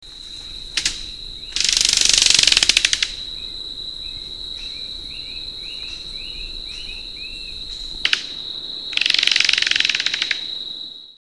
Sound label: Black Guan